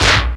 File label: PERC FX